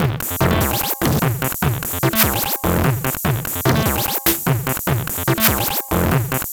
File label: Organic, Drums Beats